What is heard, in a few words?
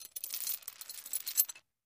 Med Chain Raised